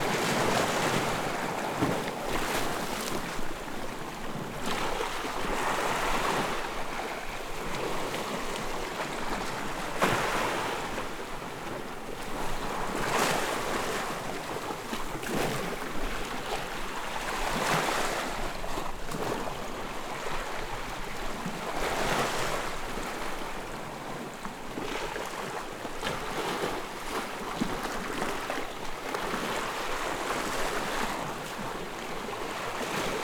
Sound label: Sea small waves against rocks and holes; Dizzy Duck; Sounds From Nature